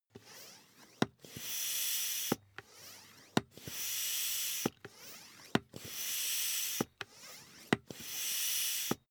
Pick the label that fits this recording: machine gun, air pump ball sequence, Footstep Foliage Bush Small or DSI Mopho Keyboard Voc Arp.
air pump ball sequence